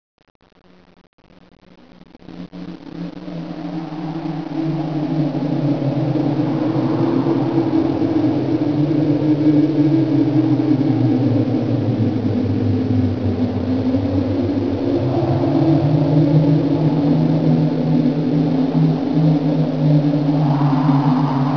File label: graveyard wind